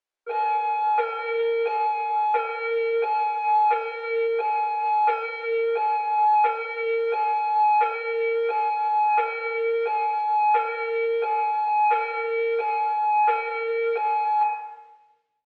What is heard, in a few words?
Hi-Low Siren; Yuba County; Emergency Services